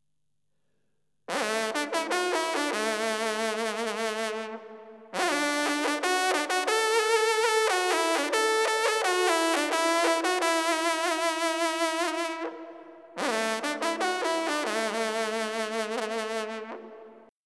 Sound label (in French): Echos de Chambord, trompes